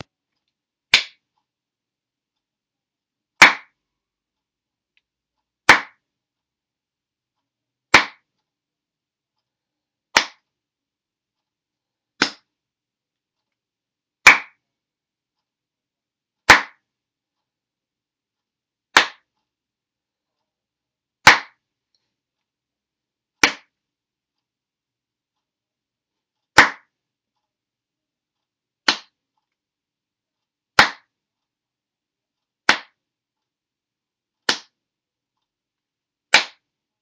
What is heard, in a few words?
clapping